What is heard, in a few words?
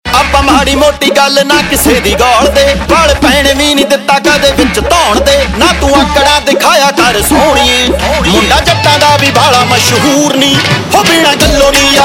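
Ringtone, Punjabi Pop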